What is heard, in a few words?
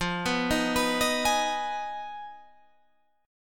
F Minor 7th Flat 5th F down; Fm7b5; arpeggiated